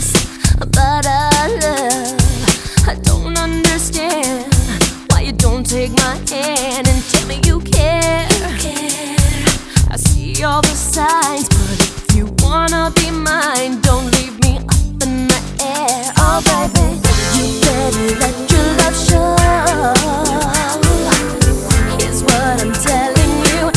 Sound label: pop